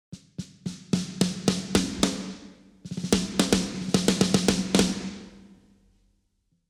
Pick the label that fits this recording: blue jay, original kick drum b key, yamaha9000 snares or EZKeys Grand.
yamaha9000 snares